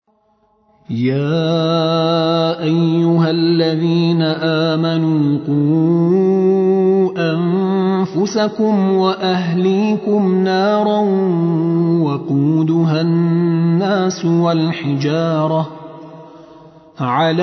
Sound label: Tar